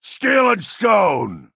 attack; stoneshard orc

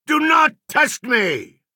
attack; skilled blacksmith

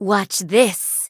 attack; guildsworn revitalizer

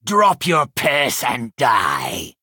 attack; helstrom footpad

